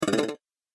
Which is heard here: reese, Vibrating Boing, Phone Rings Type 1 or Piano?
Vibrating Boing